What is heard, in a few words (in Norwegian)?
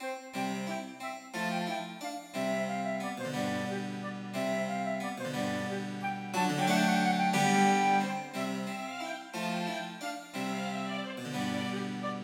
Paspied for fløyte, fiolin, cello og cembalo